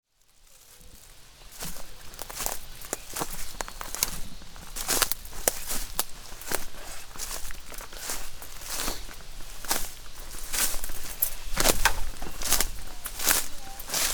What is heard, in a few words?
foot falls in woods with breaking sticks